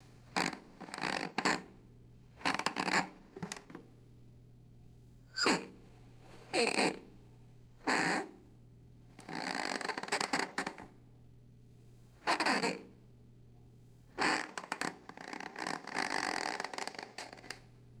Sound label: plastic lid opening and closing